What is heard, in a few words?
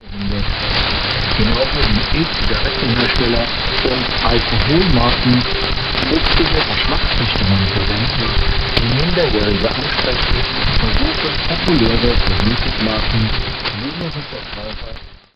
SDR NZ 5945kHz